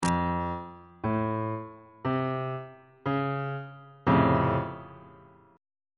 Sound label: d flat augmented major 7th 1st inversion on bass clef